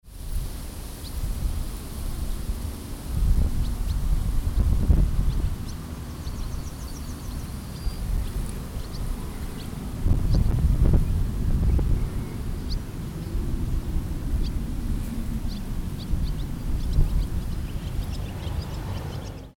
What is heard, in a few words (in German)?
Rauschen Weizenfeld; Radreise Skandinavien 08 2016